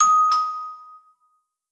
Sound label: Door Bell; blue archive